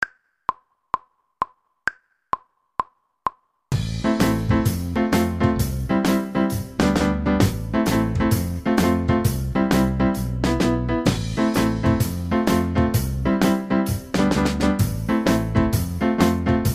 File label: keyboard section